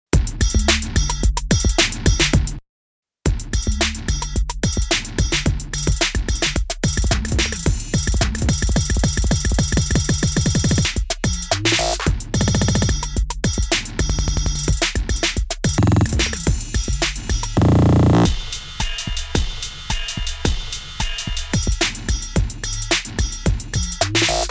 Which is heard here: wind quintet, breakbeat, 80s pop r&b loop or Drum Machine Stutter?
Drum Machine Stutter